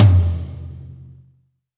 Minimal Perc; Minimal Percussion

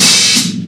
Open Hihat, Cymbals; Vengeance Samples

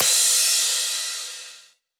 Crash; Vengeance Samples; VES1 Cymbals

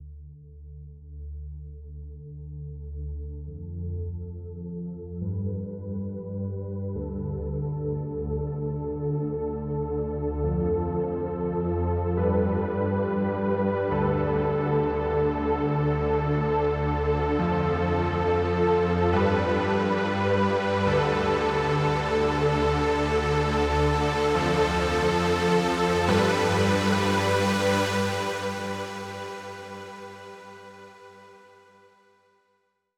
Pad Full WET 138BPM, D minor; Unlooped

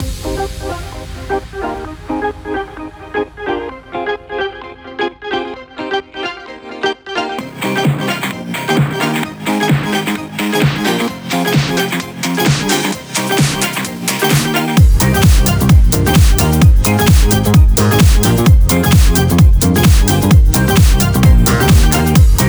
130BPM Mix; Vengeance Samples; Comboocha Kit

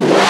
VFX Short Fills & Reverse

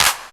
Claps; Vengeance Samples

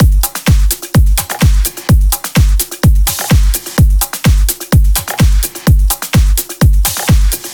127BPM Kit; Vengeance Samples; VFH2 127BPM The Mission Kit

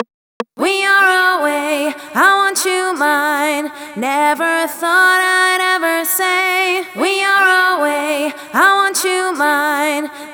Chorus Dub FX, 150BPM; alt end; Vengeance Samples

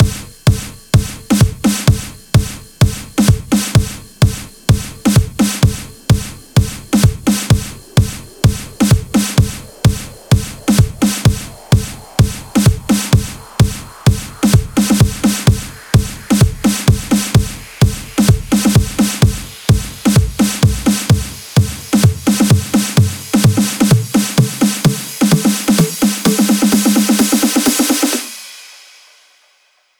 128 BPM Compression Fill; 16Bars; Vengeance Samples; House&Electro Fills

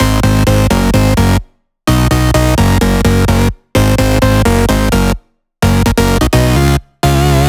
128BPM Flatbeat Melody; VFH1 128BPM Flatbeat Kit

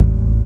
Synth Shots; Vengeance Samples

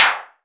Clap; Vengeance Samples

